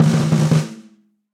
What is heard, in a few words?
Snare Press Warm And Low; Snare Articulation; Drum Hits; Session Drums Club; Standard Hits